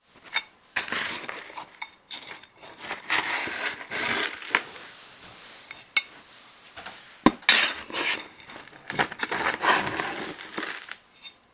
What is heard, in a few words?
Foley Trowel Dirt Moves Grit; Archeaology; BigRoomSound